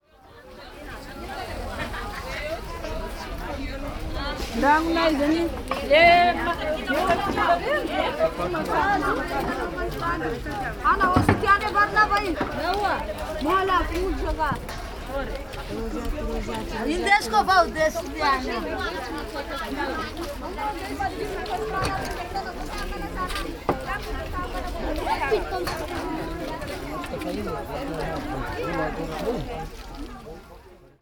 Majunga Night Market, madagascar

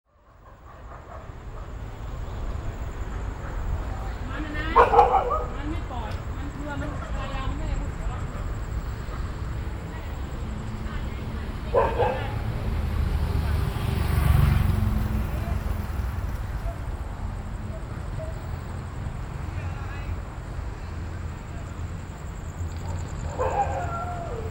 bangkok streets

